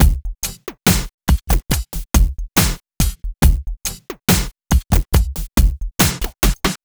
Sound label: Slomo Beat 140 BPM, Loops, Slow Motion Beats